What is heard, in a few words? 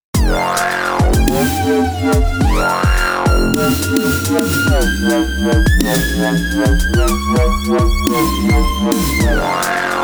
Zoom AR 48 Wobble